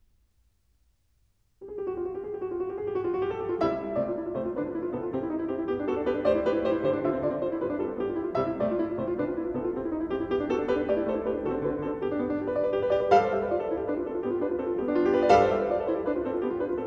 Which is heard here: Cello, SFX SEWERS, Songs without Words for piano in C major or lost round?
Songs without Words for piano in C major